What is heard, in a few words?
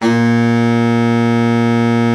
BARITON, Killer Horns, SECTION LOOP; Best Service